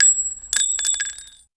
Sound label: bullet shell, impact; SINDEMO